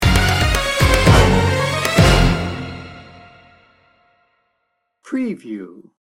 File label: bollywood action hero sting bumper